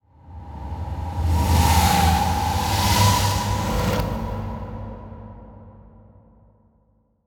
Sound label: Production Element Title Transition Demonic